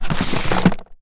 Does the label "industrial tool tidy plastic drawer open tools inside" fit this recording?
Yes